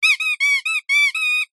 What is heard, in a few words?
leisure toy squeaky